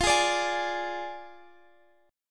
D Diminished, guitar chord

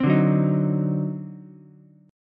D 7th Flat 9th, Ukulele C6; strummed; JGuitar

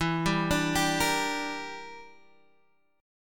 E Minor 7th Flat 5th; chord shape

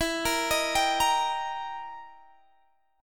E Minor Major 7th Flat 5th E down Guitar Standard; EmM7b5; arpeggiated; JGuitar

